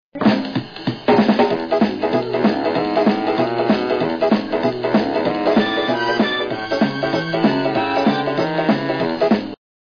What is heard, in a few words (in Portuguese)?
ritmada